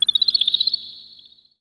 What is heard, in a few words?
Chat; MANALINK